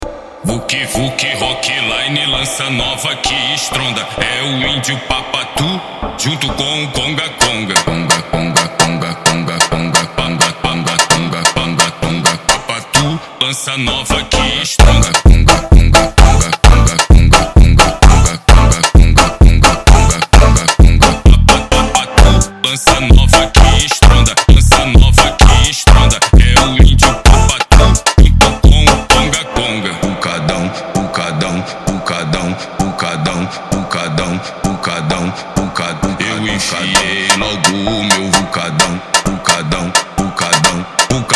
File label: CONGA CONGA FUNK